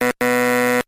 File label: error; tux typing plus